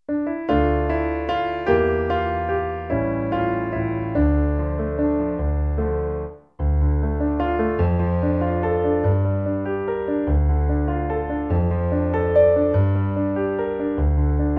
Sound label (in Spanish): PIANO; Los campanilleros